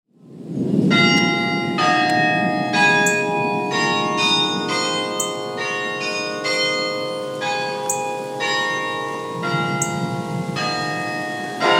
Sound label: carillon; Promenade; Range: 3-4 Octaves